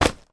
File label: household baby changing mat portable drop on hard surface; sound effects one